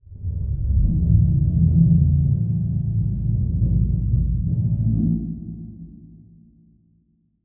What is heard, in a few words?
Production Element Title Transition Demonic